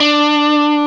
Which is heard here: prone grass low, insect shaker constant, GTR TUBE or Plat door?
GTR TUBE